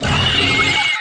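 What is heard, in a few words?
mech small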